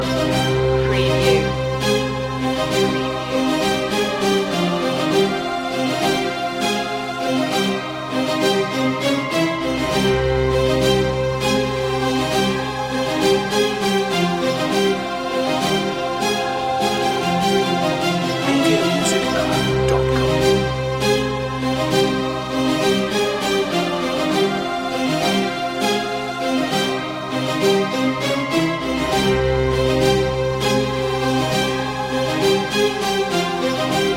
epic warfare loop

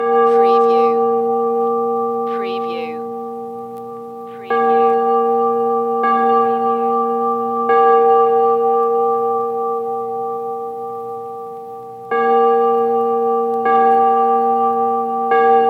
Church Bell Chiming

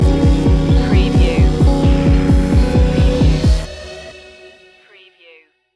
moving slowly stinger

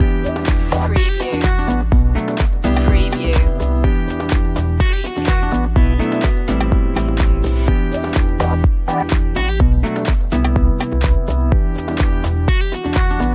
loop, chill

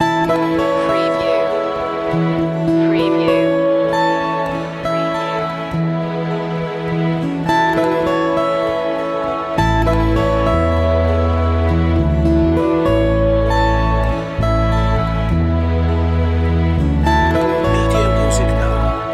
happy moments loop

